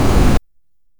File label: the sound of a iron